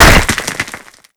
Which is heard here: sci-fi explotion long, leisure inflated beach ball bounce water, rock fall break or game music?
rock fall break